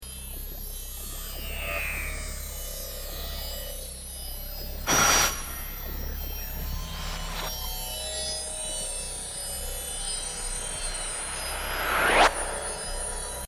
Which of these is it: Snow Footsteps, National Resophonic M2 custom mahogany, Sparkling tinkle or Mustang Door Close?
Sparkling tinkle